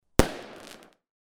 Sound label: Explosion